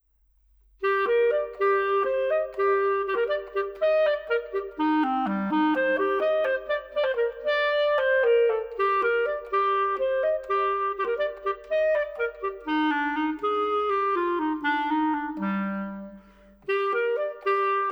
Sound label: Its Clarinet Time